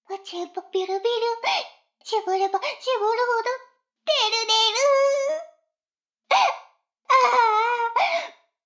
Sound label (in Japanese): シコシコ音声; 逆時間停止されて